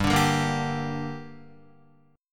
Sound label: G Minor G down Guitar, chord; strummed; JGuitar